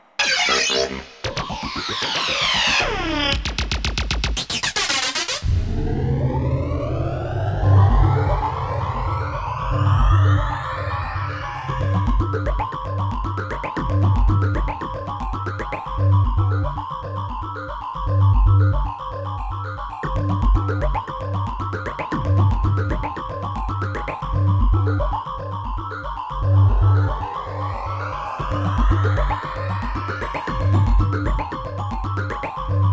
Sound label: Game Start ~ Main Ambience; Namco Video Game Graffiti Volume 8